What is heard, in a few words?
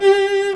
Cello short; strings